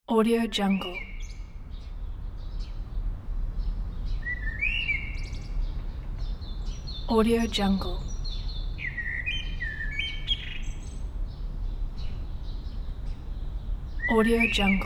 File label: early morning birds